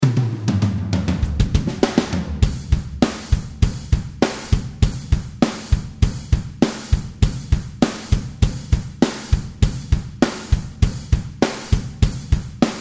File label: Drums